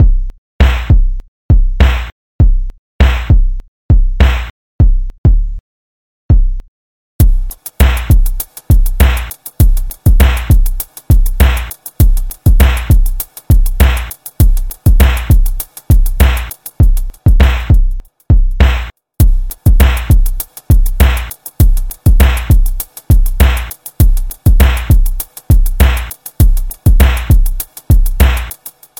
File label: Beats